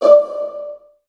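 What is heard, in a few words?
viola pizzicato D3 left, Virtuoso Strings; ILIO; Kirk Hunter Virtuoso Strings VOL-2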